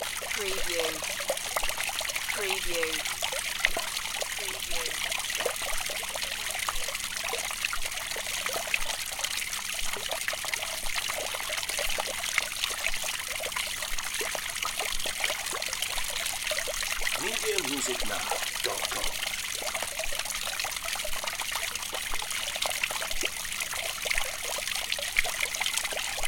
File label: Water Fountain Loop